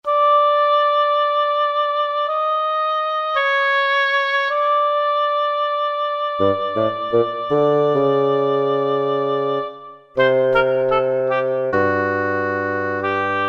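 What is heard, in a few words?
Woodwind Split, Roland SR-JV80-02 Orchestral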